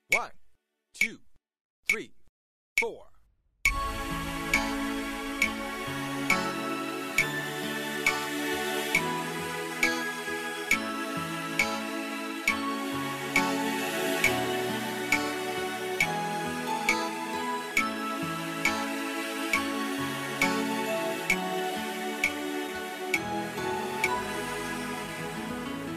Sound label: mono click Track